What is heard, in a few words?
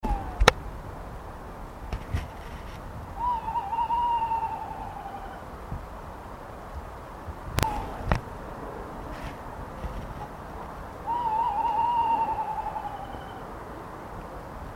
tawny owl